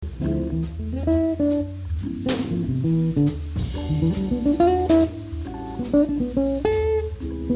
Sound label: wes licks